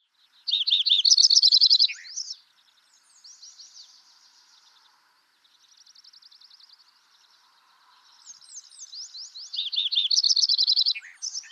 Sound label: Lincoln's Sparrow Melospiza lincolnii; sparrows